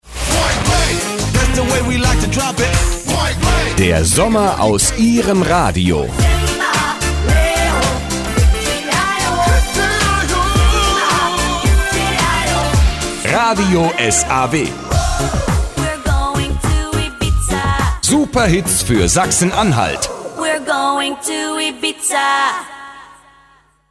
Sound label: saw hook